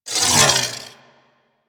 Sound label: Proj Whiz; Enemies; Sentient Dolicholyst